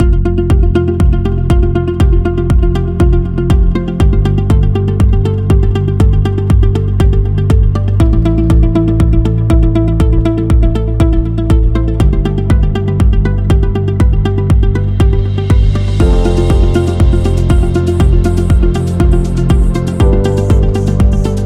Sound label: The Corporate